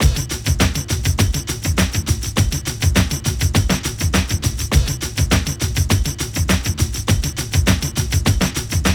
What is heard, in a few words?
AFRICAN 100 BPM, loop; 100-114 BPM